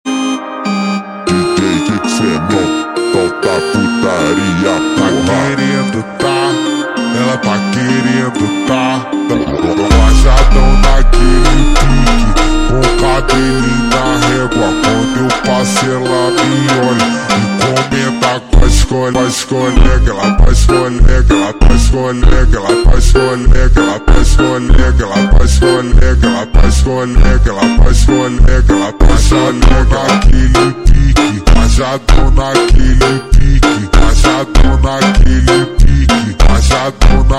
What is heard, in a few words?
Funk ultra Slowed